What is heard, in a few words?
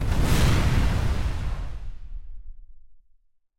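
noise of a huge object falling into water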